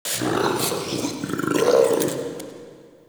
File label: Interior Munching; Ambience; Indoor; Warframe; Infested Eris